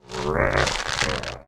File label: war idle; Fitton; Mob